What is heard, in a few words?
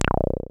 SYN 303, Roland TB-303